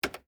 Computer Keystroke, Technology, Computer, Keystroke, Single, Large, Terminal, Keypad, Vintage